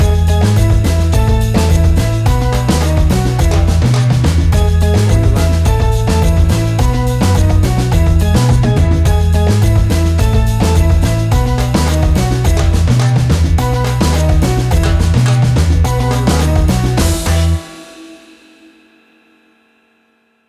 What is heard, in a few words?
African Reggae Fusion